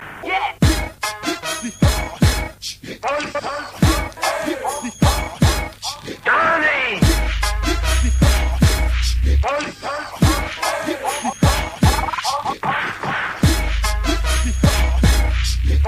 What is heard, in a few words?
Is Calling Rap